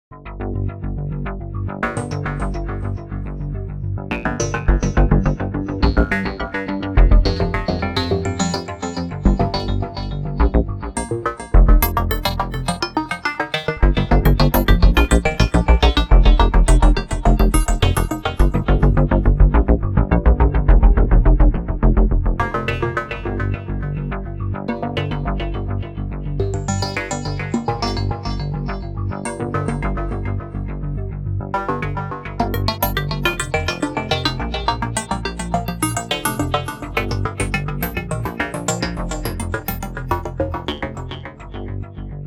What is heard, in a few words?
wavestation